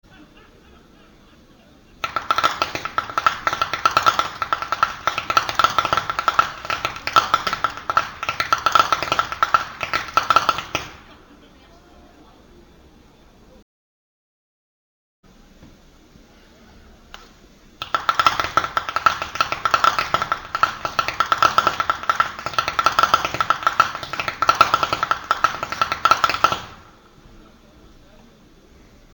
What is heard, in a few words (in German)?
schnalzen; wiederlohen